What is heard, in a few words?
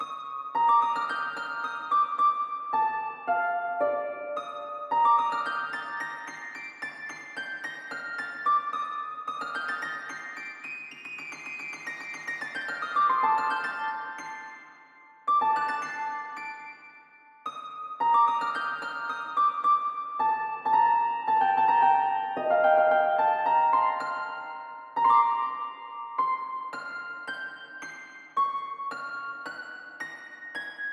Harp; Toki Tori 2